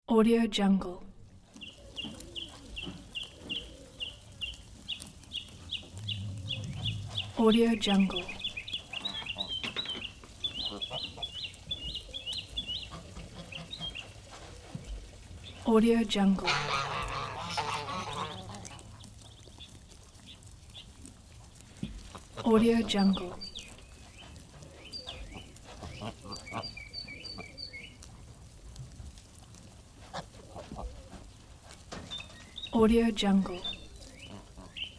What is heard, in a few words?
farm feeding geese